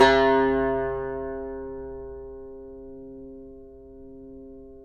CUMBUS C, Guitars

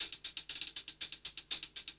120 HIHAT

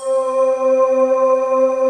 FUTURE VOX

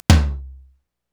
TOM, Korg Electribe EMX-1

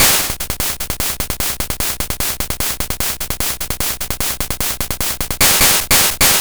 Game Boy Noise Crash Hats Loop

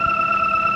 OPTIGAN E4; Sonic Reality; Vintage Timetraveler

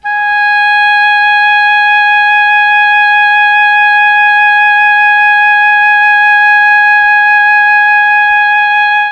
G#3 OBOE, Mellotron; CHMBLN OBOE; Mike Pinder - Mellotron Archive CD

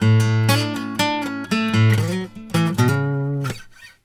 Blues Guitar Samples; ILIO; Fretworks; LICK

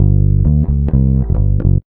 REAL BASS, BASSLICKS; Ueberschall; Jam Box

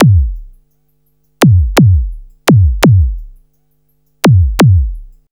loop 85; AMG - Vince Clarke Lucky Bastard

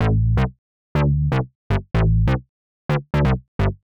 Synth Bass A, 125; PowerFX - Nu Jazz House

